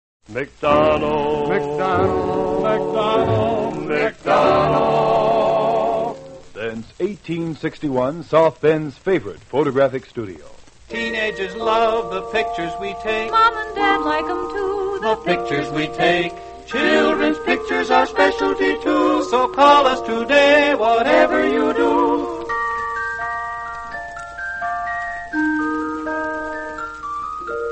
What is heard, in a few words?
radio jingles; mcdonalds photo; allied radio artists